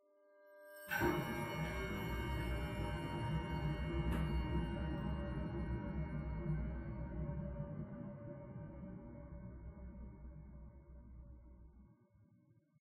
Bells Interior; The Sinking City

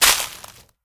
Character Bounce; Angry Birds

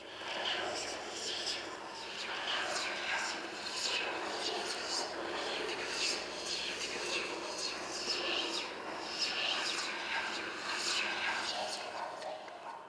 Amb Whispers; Lucius III

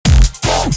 Dubstep

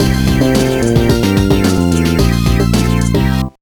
Promotion; the urbz sims in the city; gba

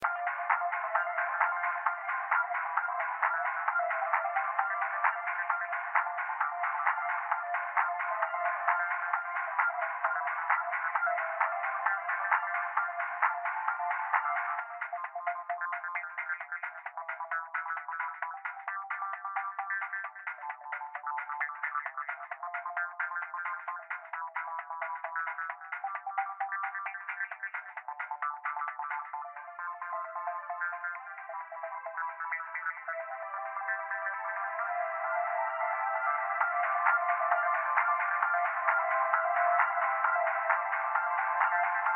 dance and play muffled

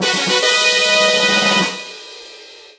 Levelup; Neko Atsume

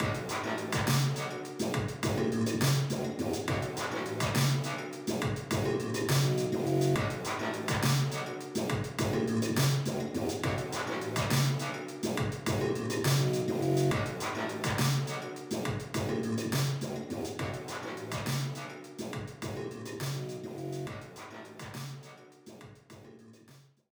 BGM Mission Stage Leak; Alterna 03

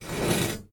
Door Slide Tile; Asbourg

